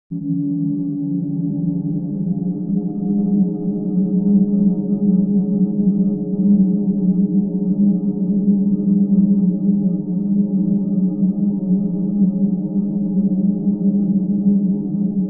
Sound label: Atmospheric music